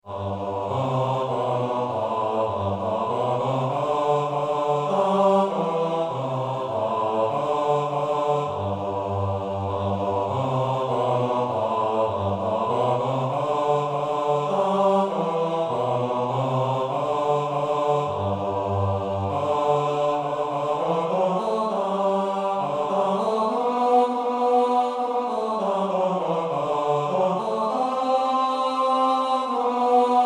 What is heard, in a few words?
Bass